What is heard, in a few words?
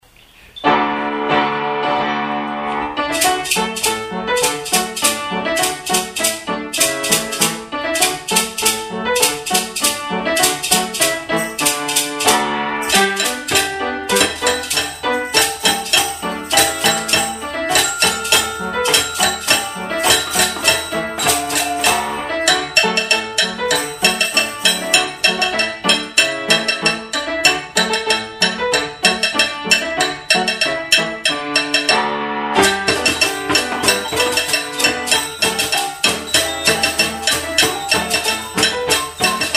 Rhythm and Drumming Ensemble; Oh Susanna